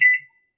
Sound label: Microwave Button Beep; soundscrate